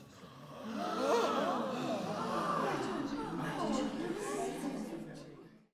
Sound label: crowd Reaction shrine small Reveal; spellbound